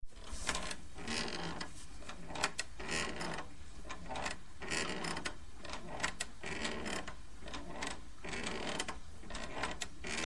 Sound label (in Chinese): 荡动; At Home Alone Final Online